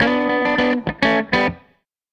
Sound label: Strat Guitar Loop